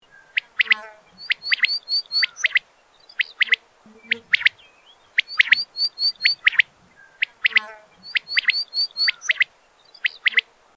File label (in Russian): perepel, birds